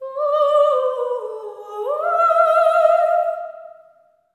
LAMENT

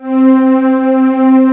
STR C3; WIND #2

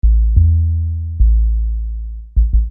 Low Sub; Construction Kit; HIP-HOP; Sonic Foundry (Sony Creative Software)

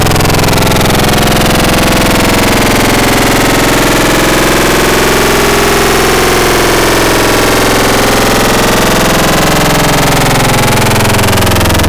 Saw Growl; Serum; Wavetables; Antidote Advent

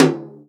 tom, Real Drum Kit, jungle kit

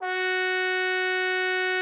trombone F#4; Xsample VOL-3

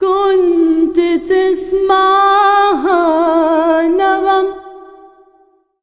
SINGER A, Arabian Traditions

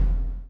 CHAIN; Spectrasonics - Backbeat

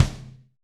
tom, Roland MC-909

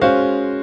CHORD L, Drum & Bass 140 BPM; Ueberschall; Drum & Bass Flash Trackz

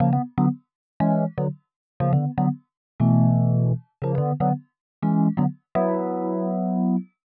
120 Rhodes Riff Chords E, Keys, Nu Jazz House; PowerFX

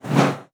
BOWPERC, BASS FINGER; Xsample VOL-5

